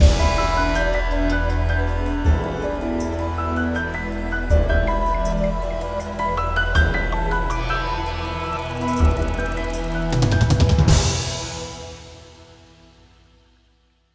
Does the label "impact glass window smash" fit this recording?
No